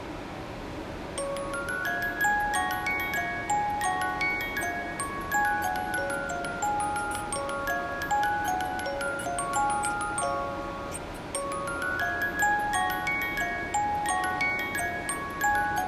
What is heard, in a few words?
Forest